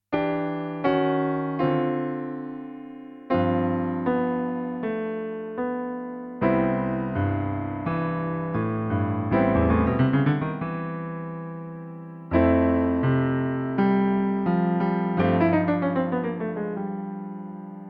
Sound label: karaoke